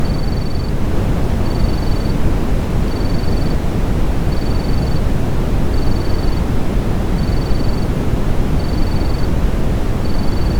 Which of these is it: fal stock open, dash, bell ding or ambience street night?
ambience street night